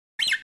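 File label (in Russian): мультфильм звуковой сигнал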